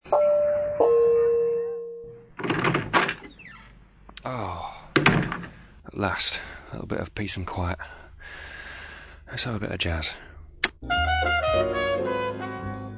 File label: jazz; spirit of jazz